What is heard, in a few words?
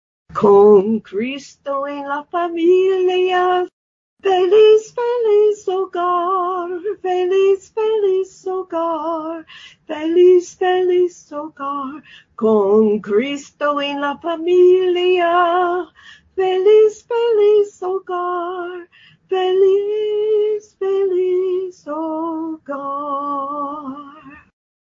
Happy Happy Home (Spanish)